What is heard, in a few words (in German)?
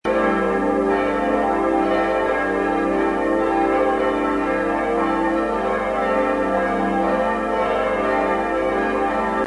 Garnisonkirche